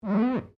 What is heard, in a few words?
GLASS SQUEAK FINGER